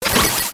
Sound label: medi shield burn, weapons; tf2